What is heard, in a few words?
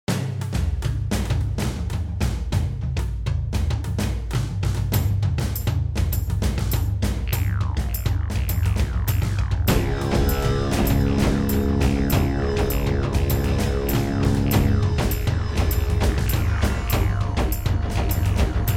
drums fast; heroic; The battlefield sketch